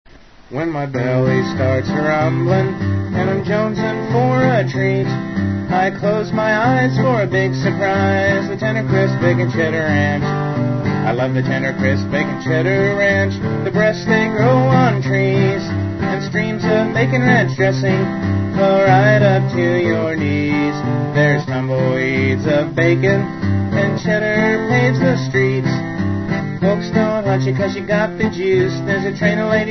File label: commercial